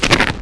GROTTLE

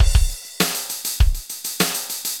ROCKS, ROCK POP DRUM; MAGIX

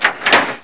DOOR; ARMRCMD

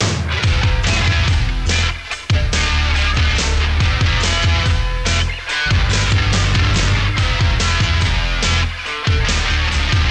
ROCK TUNE

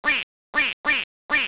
DUCK

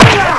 pain; JOSEPHIN

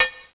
tink

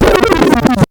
tape; BEAT2000

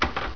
chunk; CLICKS

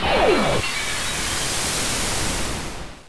SPRAY; THEXDER

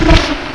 SPIT; THEXDER

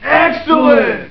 LANDED; GAMESMITH; MOONROCKS

